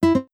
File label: match leave